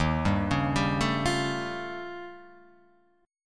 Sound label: D sharp 9th Flat 5th D sharp, Guitar Open F; D#9b5 arpeggiated; JGuitar